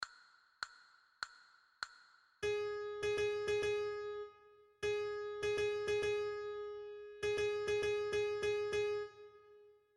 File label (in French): Sol diese, flute a bec